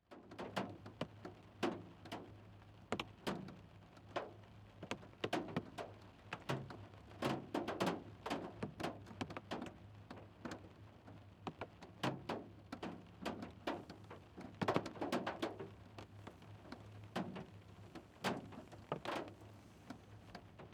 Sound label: Ambience Traffic In Rain From Car Interior; AdobeCC